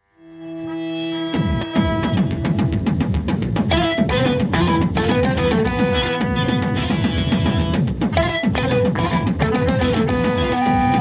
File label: ringa tone intro